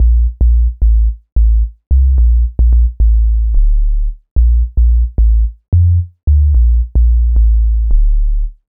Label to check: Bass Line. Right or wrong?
Right